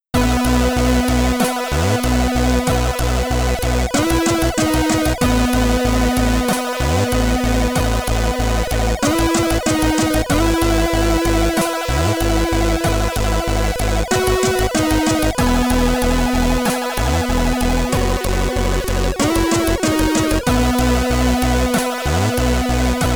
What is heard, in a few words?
Loading Theme, SID Stereo, Commodore 64; Parsec